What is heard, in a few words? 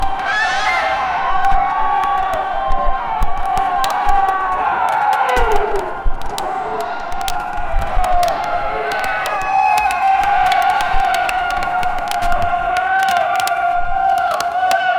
a high intensity martial, arena